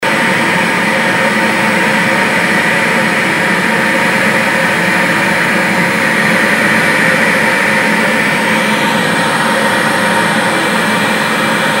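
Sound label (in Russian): звук помех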